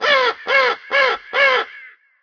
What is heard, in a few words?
crow, zombie town; hl2